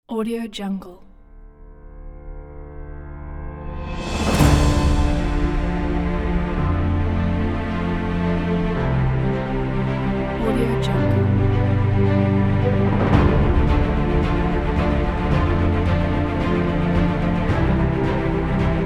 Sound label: epic